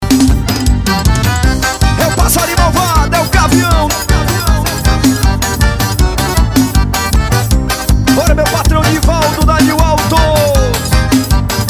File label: pagode